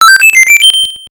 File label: 1UP; DonkeyKong GB